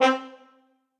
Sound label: Short Staccatissimo, Brass Quartet; Ableton; Spitfire Audio; Individual